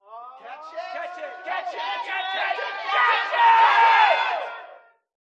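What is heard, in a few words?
Ambience Baseball Crowd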